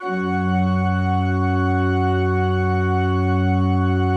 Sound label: F1, Cathedral Organ; ILIO; Propeller Islands Cathedral Organ